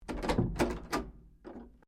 DOOR WOOD INTERNAL OPEN, Sounds onboard HMAS Castlemaine; Boats; Vehicles